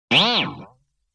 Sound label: Boing Box Short Hit